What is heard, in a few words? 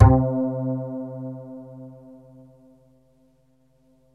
BASS SWEEP, MORPHEUS; Syntec; Wall of Sounds